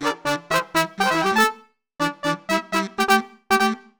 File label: motown, brass; arturia